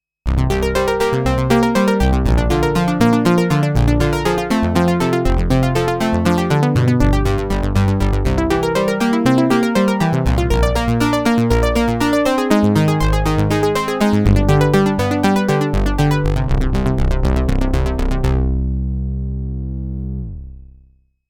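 Doublearp, Sequential Prophet '08, factory program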